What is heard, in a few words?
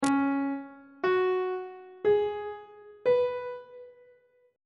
c sharp dominant 7th suspended 4th chord intervals; treble clef